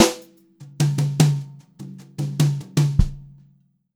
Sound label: JZ FILL, Brushed Drum Loops, JAZZY; USB Soundscan vol.39; AKAI